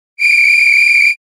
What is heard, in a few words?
whistletone